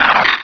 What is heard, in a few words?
Cry